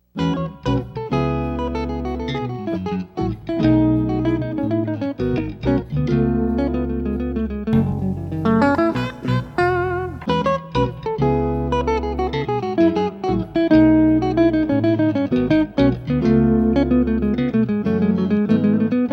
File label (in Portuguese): rural instrumental